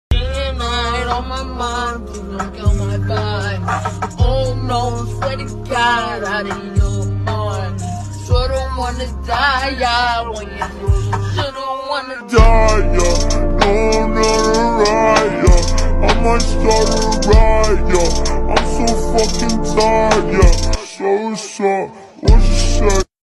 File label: music sad